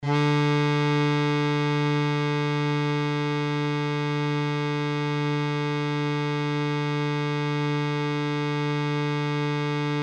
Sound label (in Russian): 3 struna, struni gitari